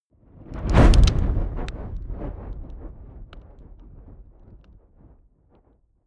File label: fire whoosh crackling hard; swooshes-swishes